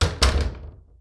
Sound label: door; Myhome